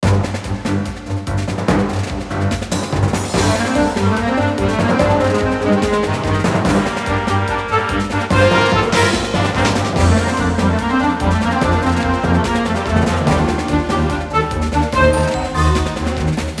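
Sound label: Short Loop Orchestra; Kirby Planet Robobot; 3DS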